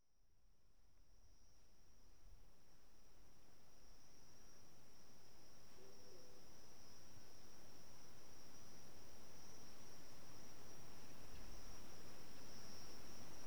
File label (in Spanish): Paisaje sonoro ascenso (Montaña Sagrada); aSeckbuy